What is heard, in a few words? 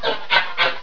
headcrab idle; svencoop